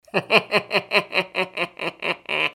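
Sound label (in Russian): звук смеха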